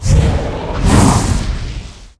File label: minotaurus lord attack, monster